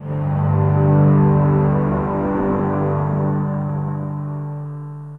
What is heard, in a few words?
Ground, Elektrik Drone, One-Shot Beds; Sonic Foundry (Sony Creative Software) - Friendly Fire; DroneLoop